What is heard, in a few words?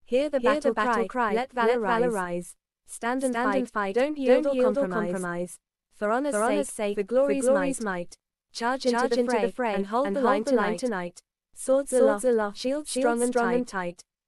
chant